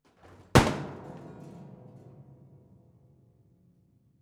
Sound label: Push Over, Piano Destruction